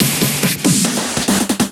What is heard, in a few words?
Fills, Loops 140 BPM